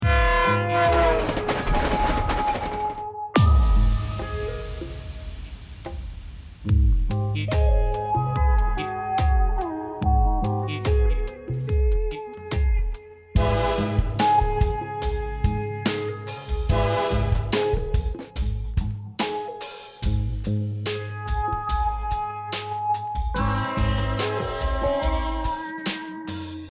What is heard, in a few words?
slo funk train